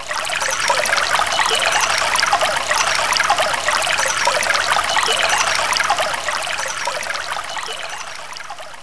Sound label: water